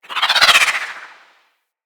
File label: Arch Wing Dodge Roll Right; Vehicles; Movement